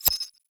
Lock Select Match, Minigame; Warframe; Sacrifice

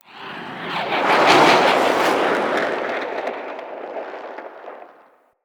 Arrow Barrage Whoosh; Midrath; Warsong Prologue

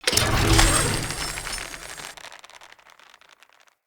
Castle Siege Zipline Start; Encounters

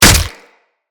Barrage Hit Flesh; Warframes; Berserker

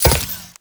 Moa Step; Characters; Warframe; The New War; Veso

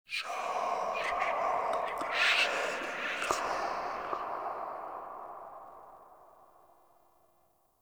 Room Whisper; Warframe; Entrati; Dead End Secret; Dante Unbound

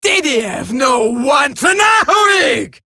attack, female; Warframe; Eximus Grineer Female; Battle Barks

